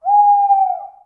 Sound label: owl, ambience; dod